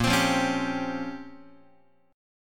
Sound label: A sharp Minor 6th Add 9th A sharp down Guitar, chord; strummed; x,6,3,3,5,6